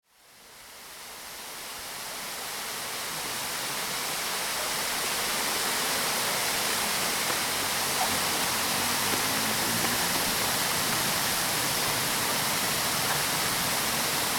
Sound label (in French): chute; zerka; Ainzerka point 1